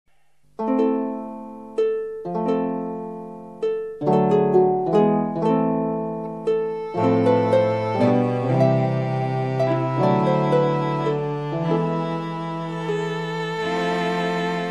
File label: country, music; Goblins3